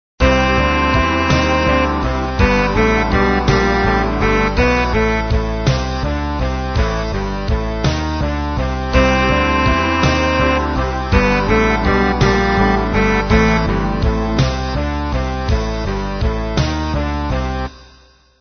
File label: midi